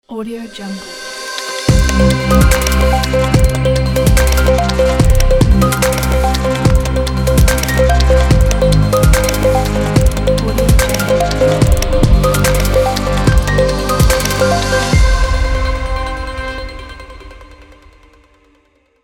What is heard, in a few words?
electronic idm intro logo